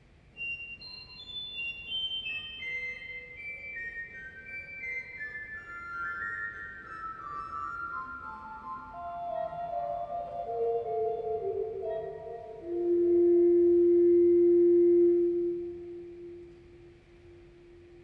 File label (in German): waldfloete 2, Waldflöte 2'; kirchenmusik; pfeifen; gereon koeln